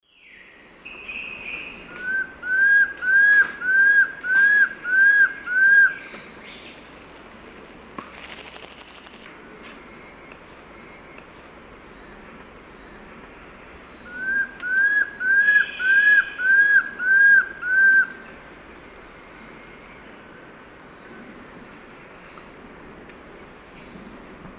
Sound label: Cymbilaimus lineatus, song; S. America; Eastern Lowlands